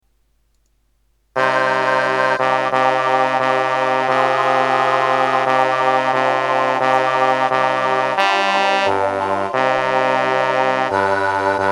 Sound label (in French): slava basses; Chants; Slava v vychnih bogou